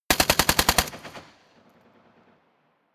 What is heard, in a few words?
rifle ak-47 exterior fire burst light; Firearms; schusswaffen